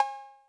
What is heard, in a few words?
Cowbell A 808 Tape